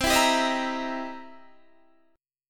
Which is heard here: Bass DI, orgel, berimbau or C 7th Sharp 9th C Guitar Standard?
C 7th Sharp 9th C Guitar Standard